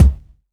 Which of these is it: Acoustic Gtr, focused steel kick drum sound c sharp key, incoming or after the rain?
focused steel kick drum sound c sharp key